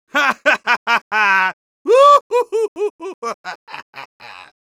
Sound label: laugh long; Risa larga 2; Medic